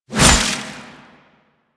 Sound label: Hover Whizzby Sorrow; Enemies; Warframe; Warframes; Pax Duviricus